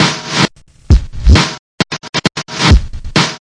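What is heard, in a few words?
133 bpm, scratch, drum loops and breaks; 131 to 140 bpm; samples-ghost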